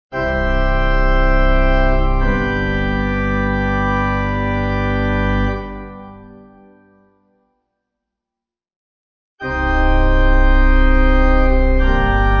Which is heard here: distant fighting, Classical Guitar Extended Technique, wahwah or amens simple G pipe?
amens simple G pipe